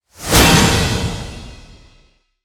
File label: Powerup Intro; angry birds rio